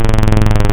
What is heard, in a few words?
Flux Saw Flange, wavetables; Animoog